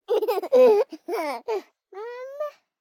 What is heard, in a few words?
BABIES LAUGH Boy 12 months Moving Clothes Mama; Baby Boom